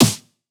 snare; Singomakers; Psytrance Mantra; Drum Shots

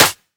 clap; Singomakers; Infected Psytrance; One Shots; Claps Snares

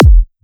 Kick, Psytrance; Drum Shots

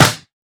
Snare, Psytrance; One Shots; Claps Snares

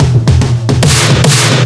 145 BPM drum fill; Singomakers; Infected Psytrance

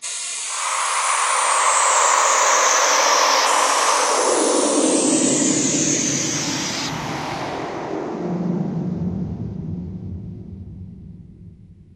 Noise FX; Singomakers; Infected Psytrance

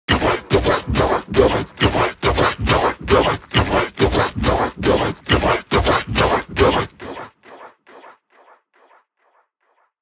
138 Shamanic Voice (Wet), Psytrance

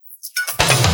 Minimal Fills, Minimal House; Minimal Loops

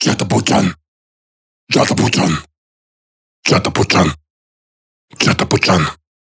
138 Shamanic Voice (Dry), Psytrance; Shamanic Voices

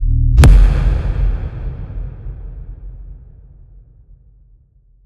Impact; Singomakers; Infected Psytrance; Impacts

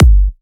Kick, Psytrance; One Shots

